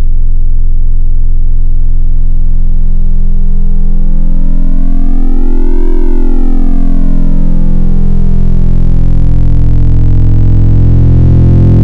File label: FM, Wavetables